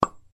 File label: ping; waze